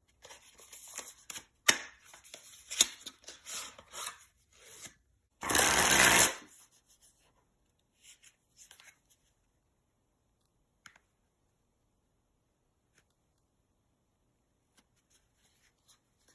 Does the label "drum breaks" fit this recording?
No